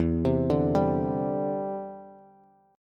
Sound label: E Minor 7th Sharp 5th E, Bass; arpeggiated